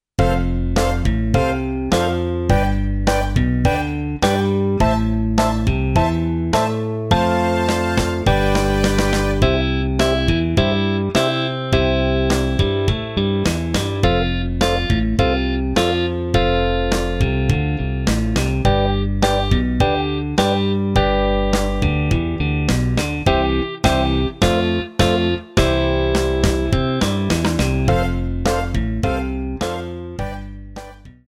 Guitar Three on E; Guitar Primer

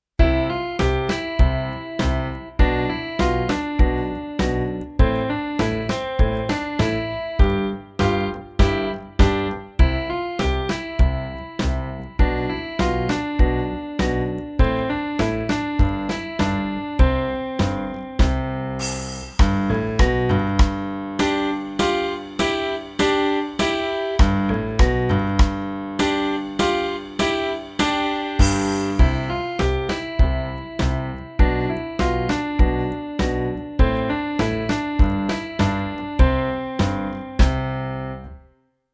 Syncopation Study

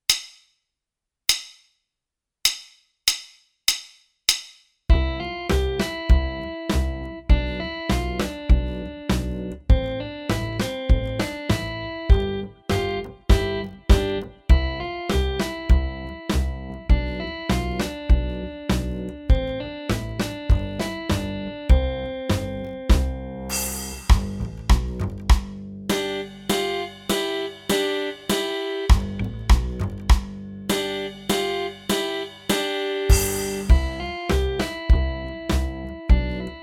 Syncopation Study Track

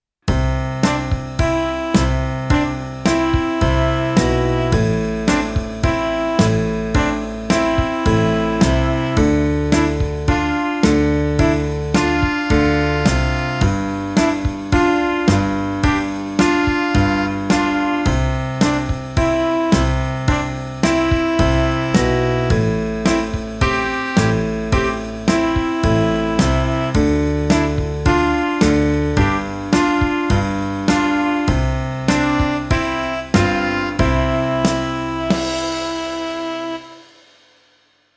Sock Hop Slow Dance

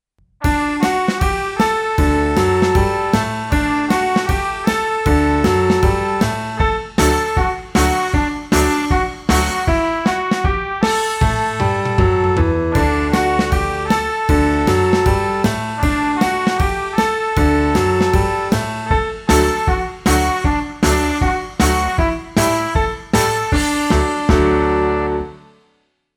Trumpet; Take It Trumpet

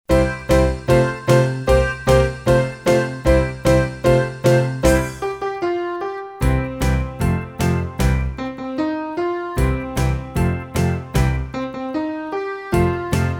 Rockhouse Blues

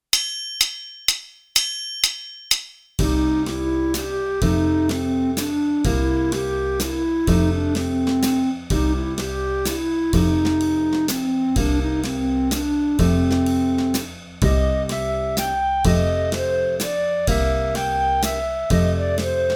Three Quarter Blues Track

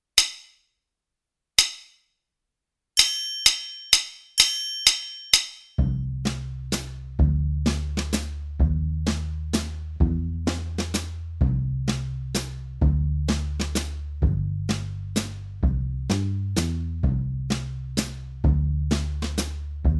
A Jazzy Waltz Track

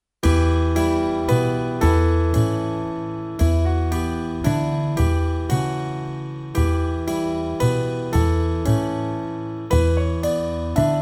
Waltz; Waltz from a Dream

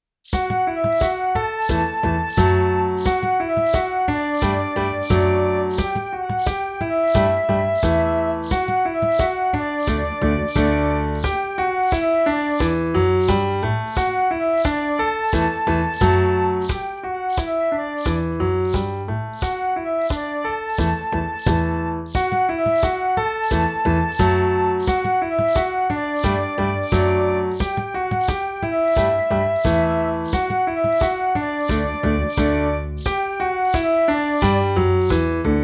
Happy Dance

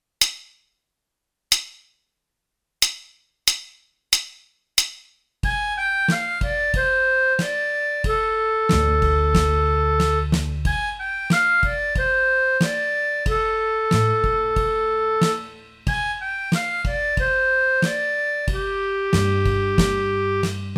Minor Mood Track

